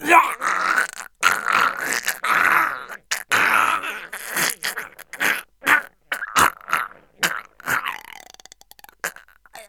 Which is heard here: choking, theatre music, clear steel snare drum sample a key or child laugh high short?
choking